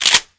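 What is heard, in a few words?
pump forward, guns shotgun; benelli; cof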